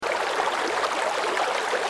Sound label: ambient water stream